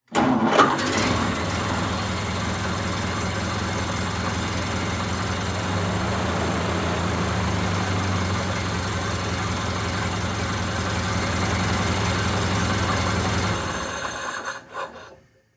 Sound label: Car Ford Model A Start Idle Off Mic In Front; transportation